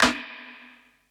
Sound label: snare; dough-samples; eddyflux